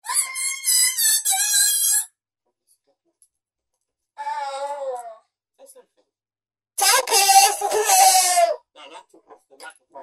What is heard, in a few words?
Voice Changer, Korg microKORG; Factory Voices